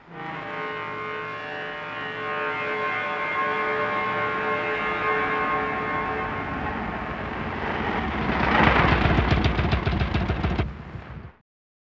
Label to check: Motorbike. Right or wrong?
Right